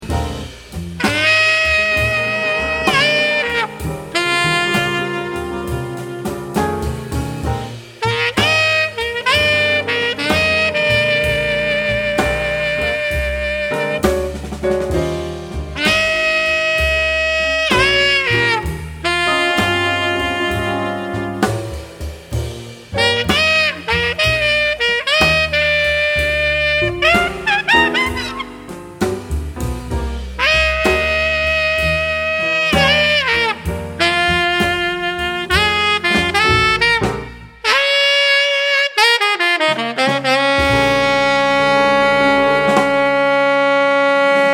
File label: growling, saxophon; effekte